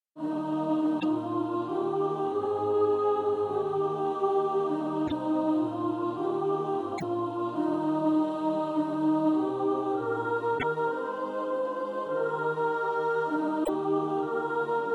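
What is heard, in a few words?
soprano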